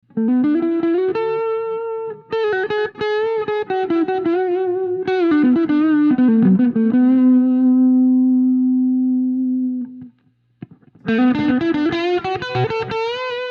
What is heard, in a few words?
Les Paul High Order PAF Plexi Echoplex